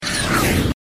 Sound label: airplane sweep